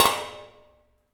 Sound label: Flask